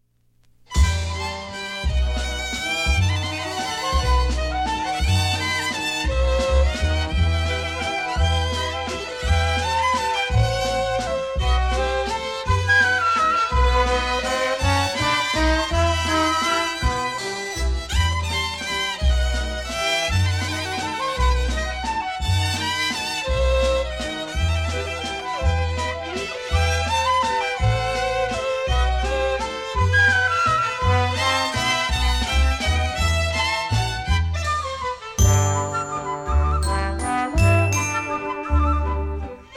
waltz

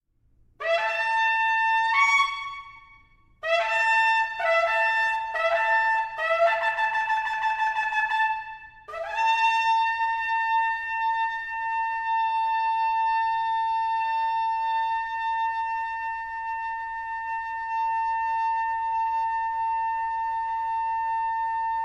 shofar blast; cantorial; mahzor for young families; rosh hashanah yom kippur

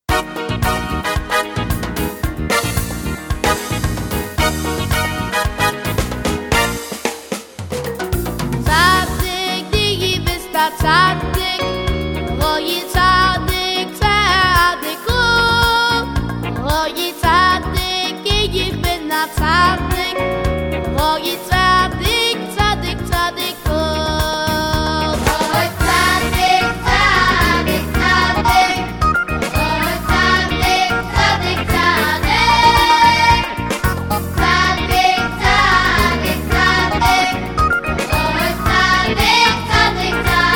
niggun

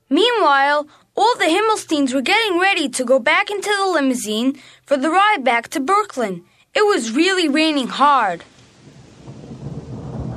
heavy rain

